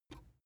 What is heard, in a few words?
plastic button small simple release